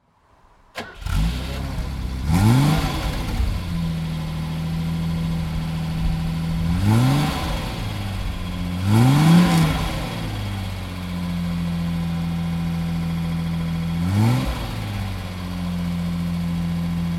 Mercedes 250C; Benz 250 C von 1969

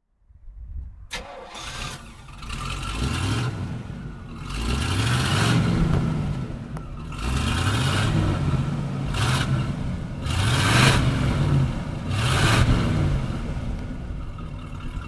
Chevrolet Camaro; Chevrolet Camaro Berlinetta

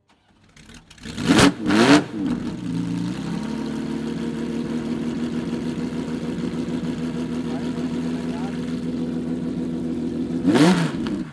Ferrari 330 P2; 330 GT

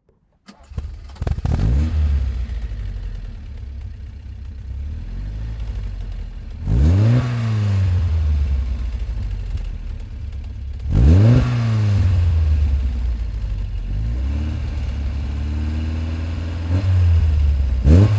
Alfa Romeo 2000 Spider

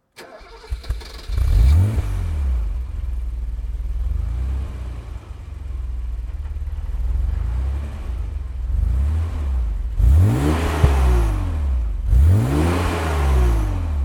BMW 635 CSi 1986 Kat

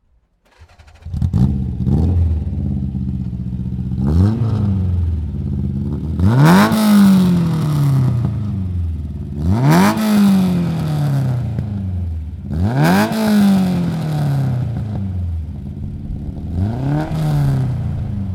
Honda S800

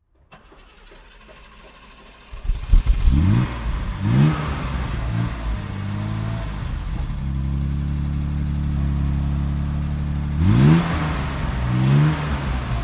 BMW 2500; BMW E3 series; BMW 2500 - successfully reaching for the stars

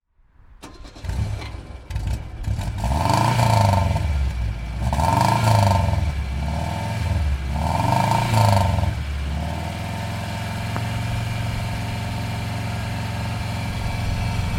Saab 96 starten; Saab 96 V4; Viertaktmotor

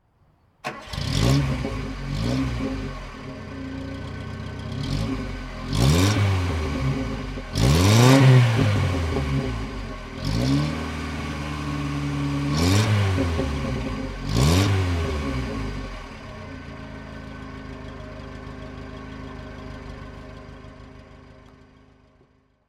Mitsubishi Celeste

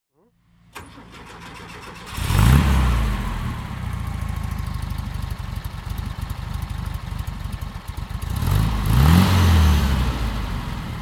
Swiss Buggy

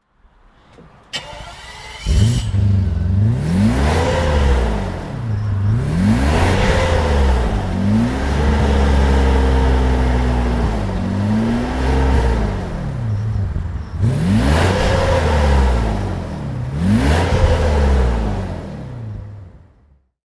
BMW 850i

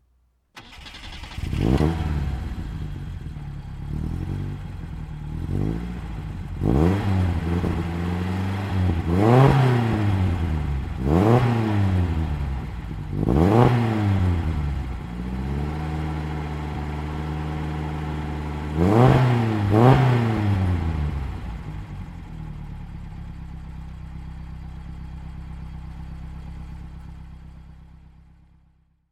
Alfa Romeo Giulia 1600; Alfa Romeo Giulia Super

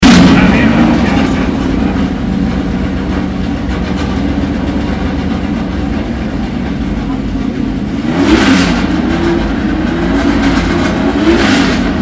Porsche 911 RSR; Porsche Soundnacht 2016

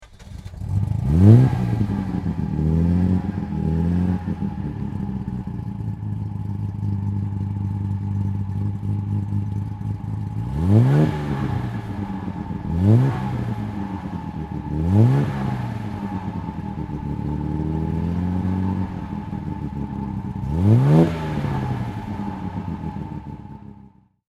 Austin-Healey

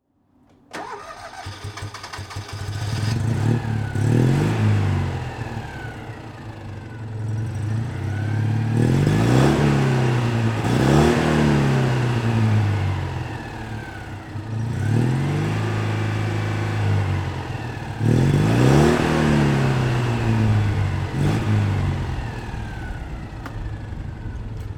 Citroen 2CV 1984

